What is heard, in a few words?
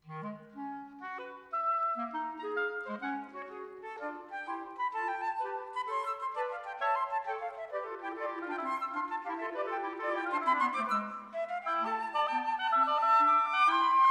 Woodwind Trio Allegro